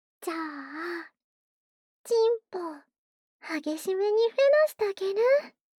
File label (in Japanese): 無垢 喉奥フェラ; フェラ素材